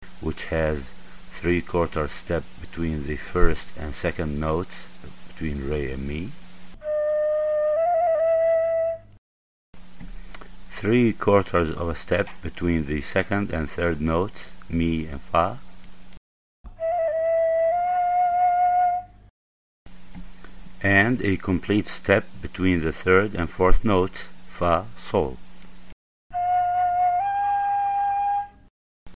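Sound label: Bayati tetra chord detailed; ¾ step between the second and third notes (Mi-Fa) and a complete step between the third and fourth notes (Fa-Sol)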